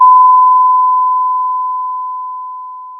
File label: pop, Sound of a doorbell